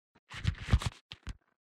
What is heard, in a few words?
grabbing